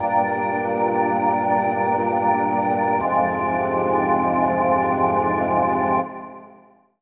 North Pier Organ; Zero-G Smoke; Keyboard

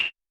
Perc Reso, Vermona Mono15 Drums

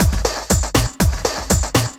Beats, Synchro Funk

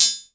clank, Korg Electribe ER-1

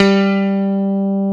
ELEPI G2

